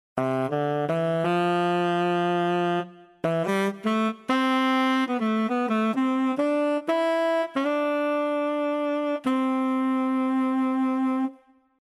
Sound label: Sax